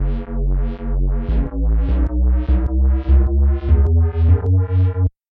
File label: C Sharp Blues